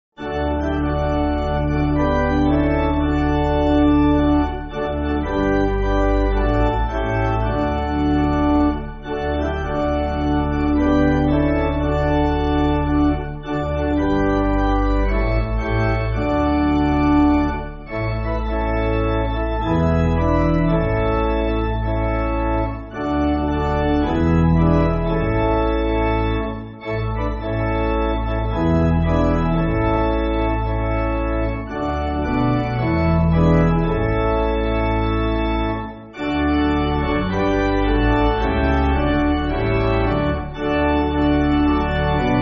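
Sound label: Pipe Organ, Backing Files for Churches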